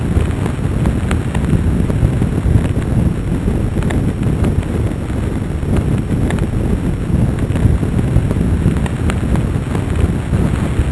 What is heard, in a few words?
burning, city